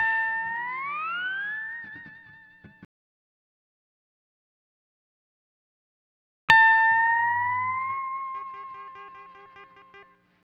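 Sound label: Steel Guitar D Dry, Lounge; Zero-G Kocktail Kollection; Construction Kitz (Lounge); Volcano at Sunrise 074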